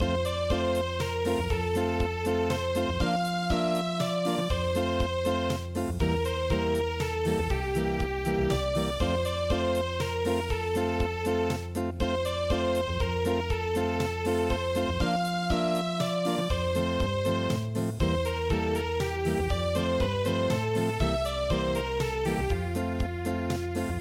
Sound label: dew drop waltz Unplugged